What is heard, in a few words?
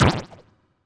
roll; Heaven Studio Plus; Flipper Flop